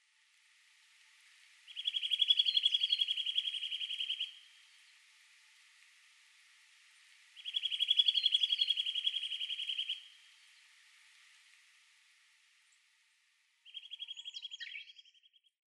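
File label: Eurasian Nuthatch, nasushiobara; koe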